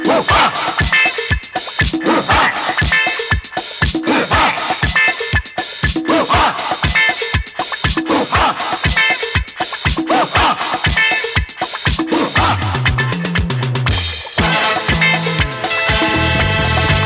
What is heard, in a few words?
music ringtone